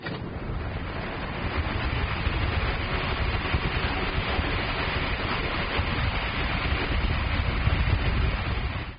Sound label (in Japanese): 扇風機の風・・・, 生活音; 生活音系効果音